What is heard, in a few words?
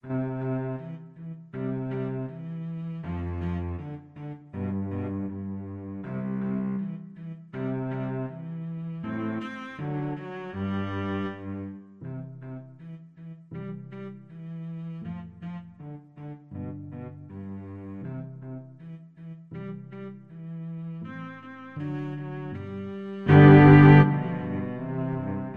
cello trio; Haydn; Surprise